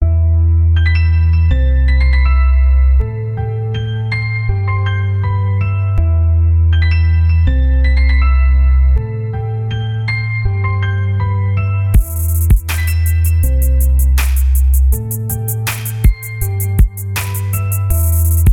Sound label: piano minus